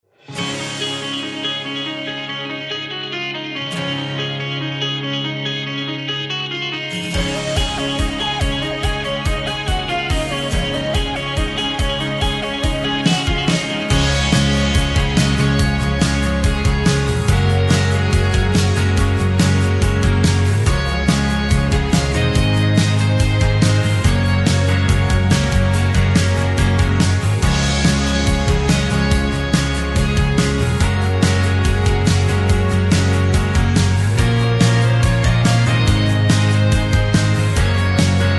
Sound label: corporate